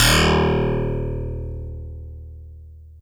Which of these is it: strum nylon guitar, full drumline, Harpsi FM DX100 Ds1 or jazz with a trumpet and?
Harpsi FM DX100 Ds1